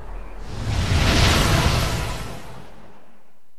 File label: windy swoosh